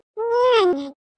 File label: cant win; lix